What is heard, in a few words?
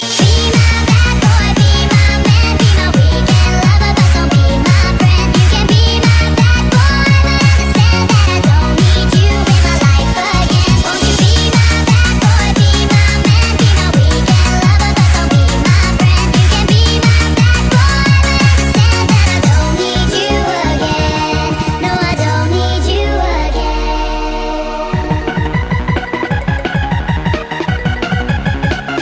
bad rock